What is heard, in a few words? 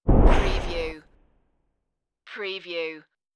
Whoosh